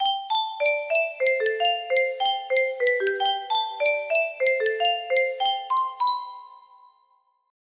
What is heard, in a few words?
melody, music box